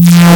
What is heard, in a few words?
SINE; WaveEdit; wavetable editor; Synthesis Technology